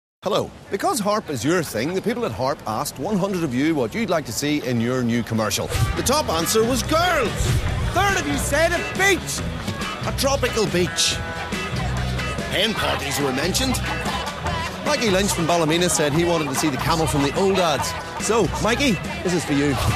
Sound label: Harp